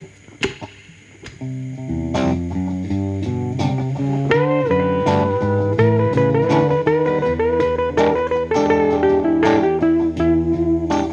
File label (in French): complet basse solo